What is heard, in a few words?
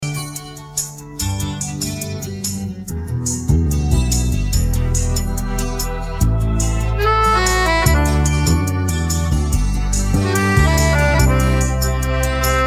Karaoke Song